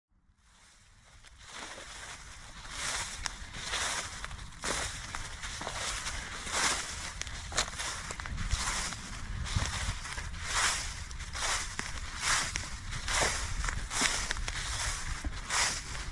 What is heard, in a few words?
Walking on dry leaves